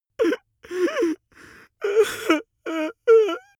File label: male crying; soundpool